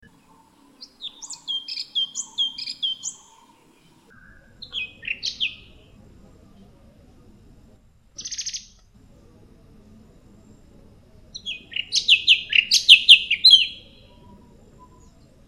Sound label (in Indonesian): panggilan cucak cungkok